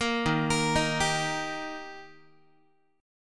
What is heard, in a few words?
D sharp Major D sharp Guitar, chord; arpeggiated; 13,11,13,13,11,x; JGuitar